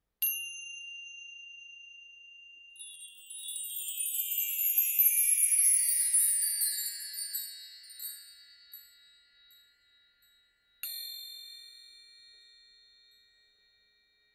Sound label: bar chimes